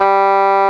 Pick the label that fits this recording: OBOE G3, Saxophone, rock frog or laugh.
OBOE G3